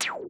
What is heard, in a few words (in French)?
transition zap; interviews